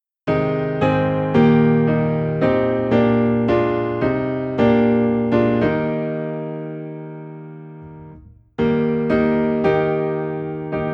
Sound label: Piano in E Flat